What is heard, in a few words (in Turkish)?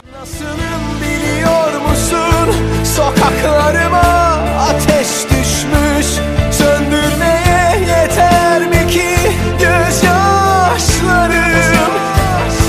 yeni turk zil sesi